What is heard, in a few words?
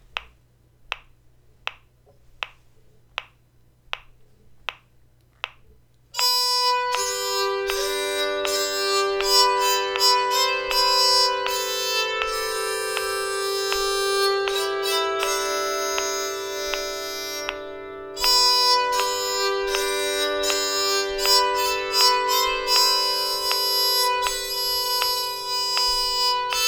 PS, bowed psaltery; I'll Fly Away